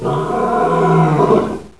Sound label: Lion roar